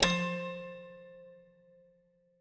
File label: toy piano